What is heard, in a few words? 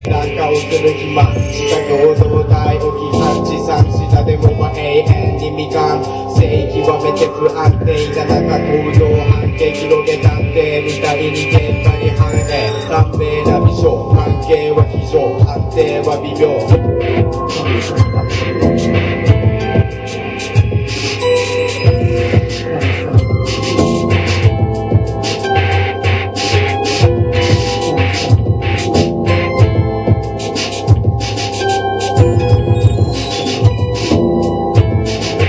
hard disco